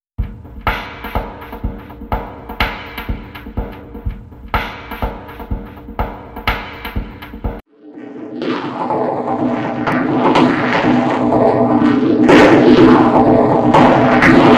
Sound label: Vocoder Plus drum; XILS VVocoder